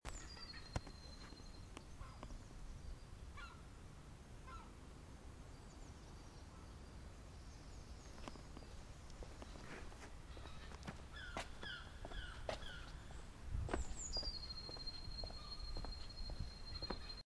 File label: spring morning